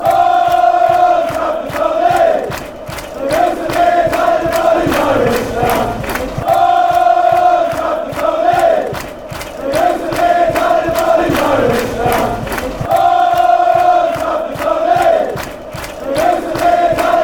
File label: oh trapattoni fanchants